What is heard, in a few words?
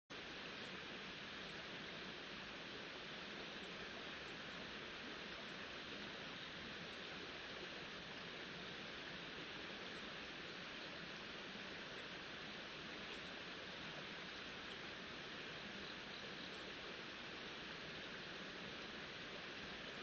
riverside; Kyoto U; Ashiu